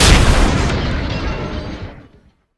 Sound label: rocket break wall; svencoop; rngremake